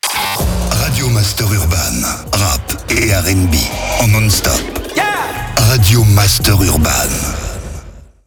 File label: JINGLE RADIO; RADIO MASTER URBAN; Habillage